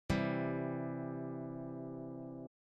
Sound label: c major triad, guitar